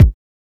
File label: 00s deep kickdrum sound a key, Royality free kick drum single shot tuned to the A note; kick drum samples